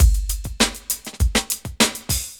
Dance Mega Hip Hop Electro; EastWest

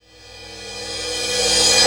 NOISE, Production Effects

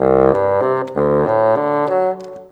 Bassoon, Orchestral, Rock Pop; Sonic Foundry (Sony Creative Software); Orchestral Series VOL-4; Rock and Pop; Rock-Pop 04

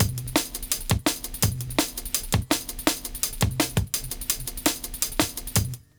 LOOP, Explosive Jungle; USB Soundscan vol.05; AKAI

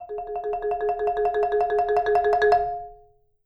Marimba roll C#4; Sampleheads - Dave Samuels Marimba & Vibes

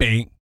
BASS FX; ILIO; Vocal Planet VOL-3; Jazz & FX

